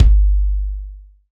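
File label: BD, kick drum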